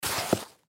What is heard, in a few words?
Tennis Shoes Up, Tennis Shoes Pick Up; Tennis Shoes Pick Up Cement; Sports; Foley; Sound Effects:Sports; SoundEffects-Blastwave